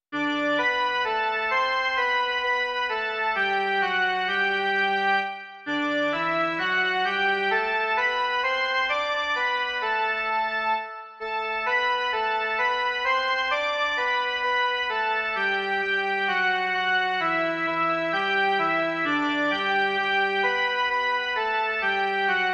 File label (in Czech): jen melodie; Kdo proti nám